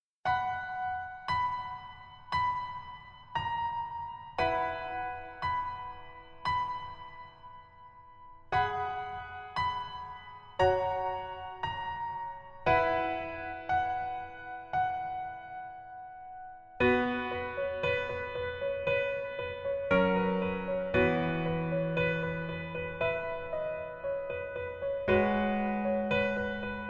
Piano ver 멜로디MR 남자키

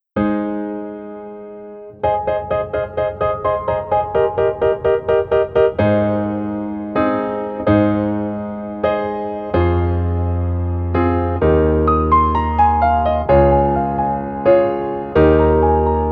Piano ver 멜로디 MR

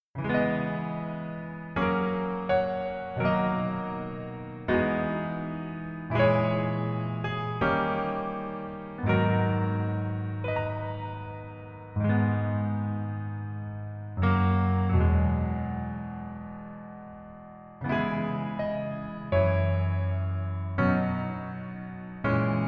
Piano ver, 남자키; 낭만닥터 김사부

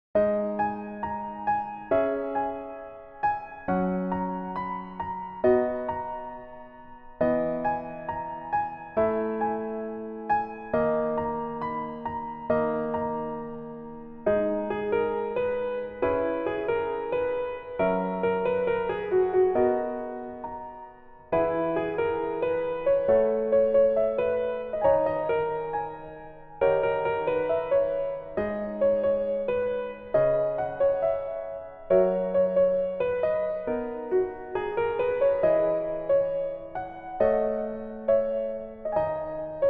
Piano ver 멜로디MR -1키; 복면가왕

